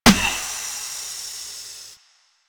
Car Tire Pop; Warframe; Ambience; Nineteen Ninety Nine

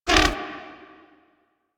Bird Three Chuff; Warframe; Ambience; Entrati

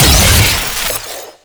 Explosive Impact; Warframe; Weapons; Acceltra Prime

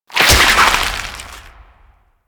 Symbiotic Link Impact, Infestation; Warframe; Warframes

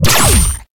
Trip Laser Impact; Warframe

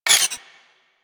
Space Port Cargo Scanned Disable Off; Venus; Objective Rooms

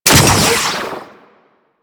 Heat Gun Fire, Weapons; Grineer

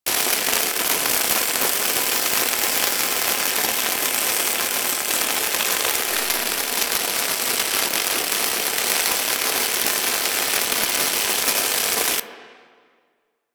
Pit Fixed Laser, Traps; Warframe; Orokin; Lasers

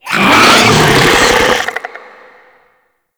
Infested Ancient Attack, Enemies; Warframe

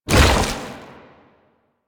Tank Infested Arm Move, Movement; Warframe; Lasrian

